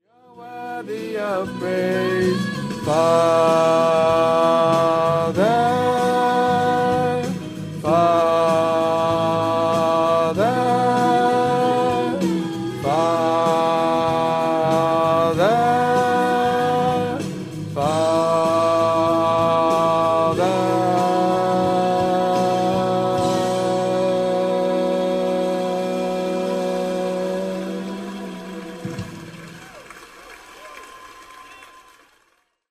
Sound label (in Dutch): sopraan laag; Father